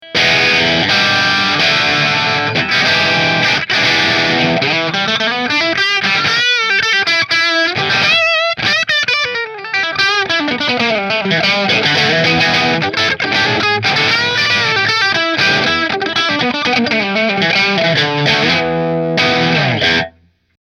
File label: Quilt Bridge Marshall; New Orleans Guitars Voodoo Custom Quilt Top